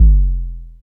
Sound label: FX Analog; Sound & Vision - Gigapack II